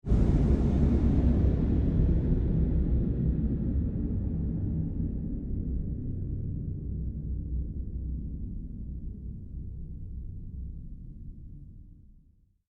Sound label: Created Pulse Long Fade, sound effects created by manipulating other sounds; Rises and Dives